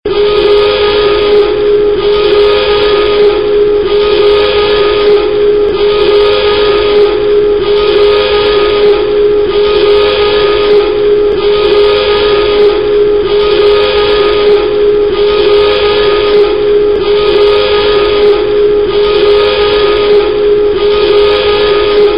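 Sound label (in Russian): сирена предупреждение об опасности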